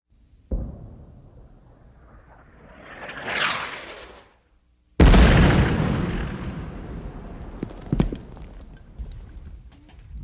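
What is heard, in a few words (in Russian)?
гаубица стреляет летят обломки камней; Звук взрыва от бомбы или снаряда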